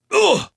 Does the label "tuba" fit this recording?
No